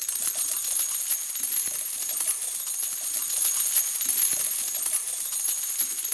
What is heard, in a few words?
coins Particles; parthenon